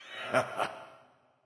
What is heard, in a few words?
heh heh; smite; hebo